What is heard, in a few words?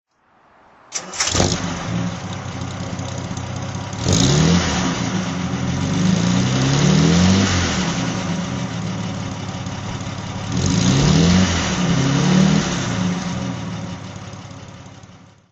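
jaguar mkIV throttle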